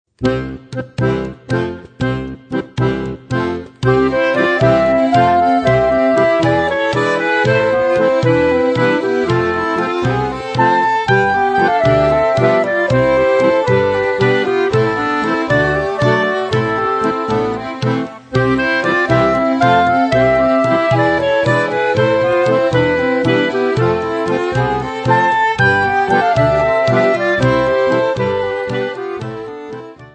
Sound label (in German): Syrtos aus Pella Griechenland, Accordion; Accordion Trip 2